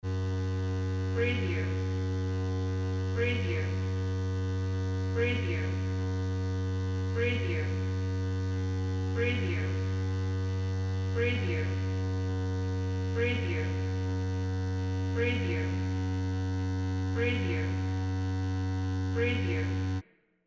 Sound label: vibration